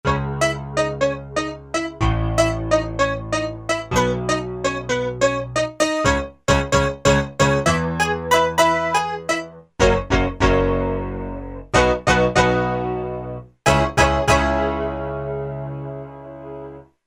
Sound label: 2 voices; Korg MSC-12S Synth 3